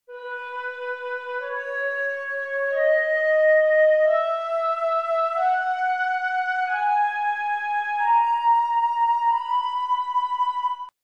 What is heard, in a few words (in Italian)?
si maggiore, scale